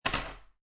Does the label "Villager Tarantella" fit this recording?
No